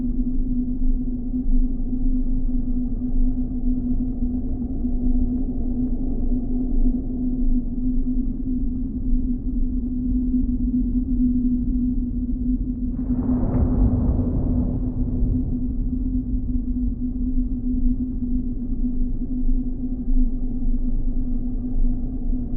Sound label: Underground atmosphere - Artificial Tunnel - Loop; unsighted